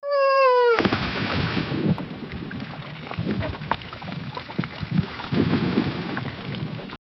waves crash wind howls, boat

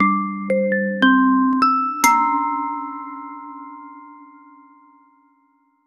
gentle melodic drop soft

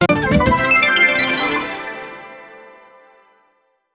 create a retro jingle ins

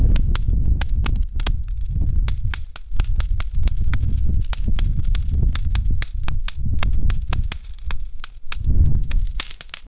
damage from fire

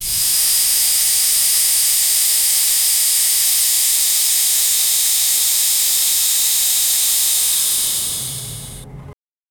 light hissing sound of st, escaping

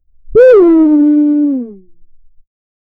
disappointed cartoon wah; negative feedback

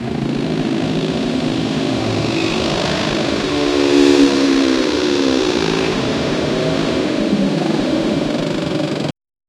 heavy fast low pitch chop, helicopter

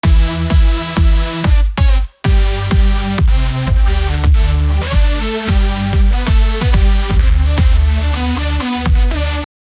drum and bass lead synth

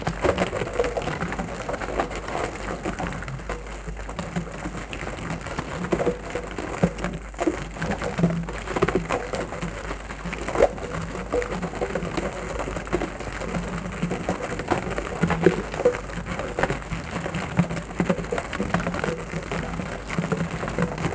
running water flowing

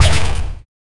soft lightning strike sou, cartoony

